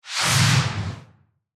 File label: Whoosh Pan, Accent, Transition; Production Element, Imaging Element; BlastWave